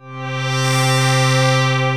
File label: Sunset Soundtrack Pad; Wavetable